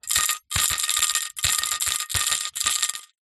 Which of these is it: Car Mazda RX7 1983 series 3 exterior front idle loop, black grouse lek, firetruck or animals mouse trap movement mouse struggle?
animals mouse trap movement mouse struggle